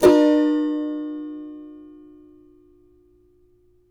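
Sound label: C major, Guitars; Spectrasonics; Hans Zimmer Guitars VOL-2; CAVA CHORDS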